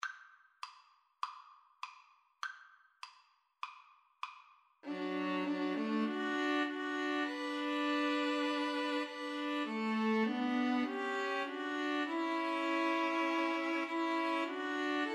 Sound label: String trio